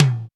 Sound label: short tom one shot b key